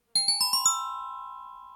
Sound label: Floating parrot